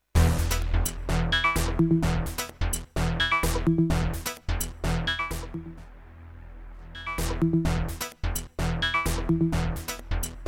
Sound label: Wavesequence; Combi; Get Down